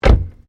CAR SUBARU LIBERTY EXTERIOR DOOR CLOSE; Subaru Liberty 20 01; Family members Subaru; Vehicles

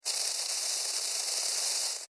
Beetle, Burrow, Dig, Insect, Bug; Beetles; Animals:Insects

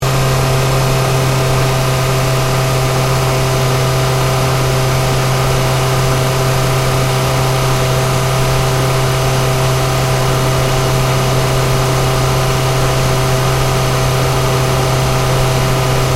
Car Ford Focus Cruise Engine Bay; Ford Focus hire car; Vehicles; Cars

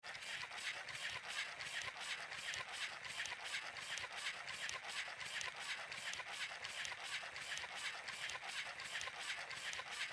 Sci Fi Servo Motor Small Robot; Walking Fast; Robots

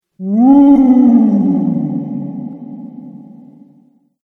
Ghost Spooky Moan; Ghost Sound Effects; horror

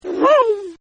Small Dog Barks; Dogs; Animals

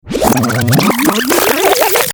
Turntable Scratching Reverse; DJ; Musical

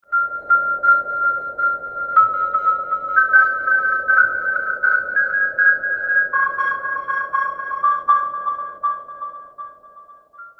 Hell Is Just A Heartbeat Away, scary soundscape; Scary Soundscape Sound Effects; horror sound effect

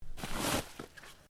Body Movement Snow Lift; Body movement foley to simulate falls and deaths; Bodies (Sound Effects:Human)

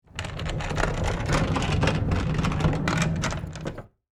Industry, Cart, Roll, By, Wood, Surface; Rolling Sound Effects; BlastWave

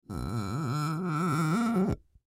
Squeak Rub Plate, Squeak Rub Plate Glass; Foley

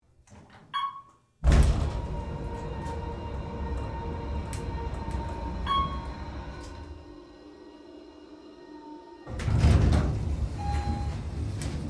Elevator Operate, Office, Ride, Down; Machines

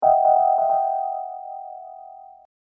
Hip Hop Dark Rhodes Licks 90 Bpm Swing E; Royalty Free Keyboard Loops

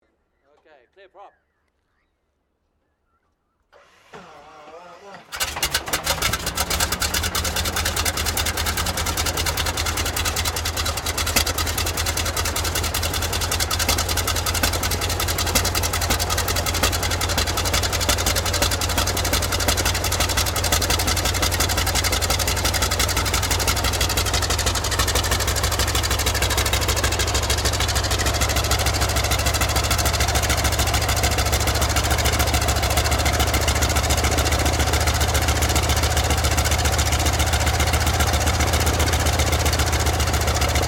AIRCRAFT CAC WIRRAWAY STARTUP STEREO; CA-16 Wirraway; Airplane; Vehicles; Aircraft Collection

